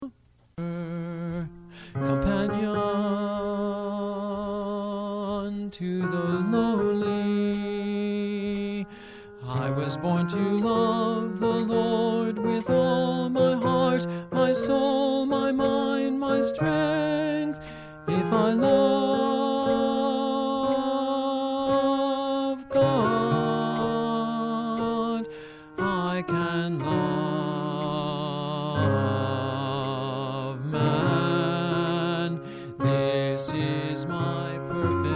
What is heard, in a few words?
Soprano; District II 2003; Mixed Choir Practice